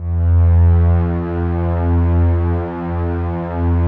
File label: ATMO PADS; Best Service; XX Large